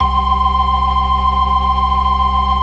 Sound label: FAST VIB, TREMELO+VIB, Synth and Organ